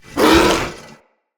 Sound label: Corrupted Battle Dog Attack Bite; Animals; Vocals